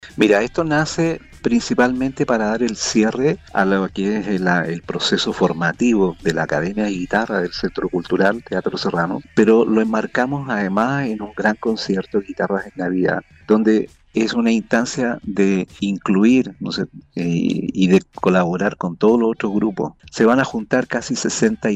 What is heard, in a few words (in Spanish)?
GUITARRAS EN NAVIDAD; tradicional concierto guitarras de navidad en el teatro serrano